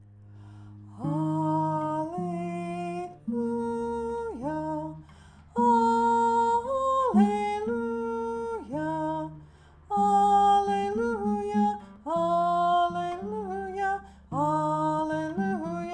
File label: Allelujah, choir; Easter 2023